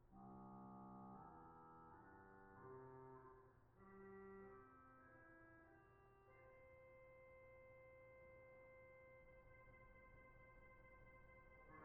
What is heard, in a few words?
swell vox humana, organ; 8’ Vox Humana; The Vox Humana is one of the oldest organ stops